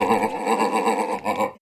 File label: orangutan long; Ed Edd n Eddy; Adromulus Pack; EEnE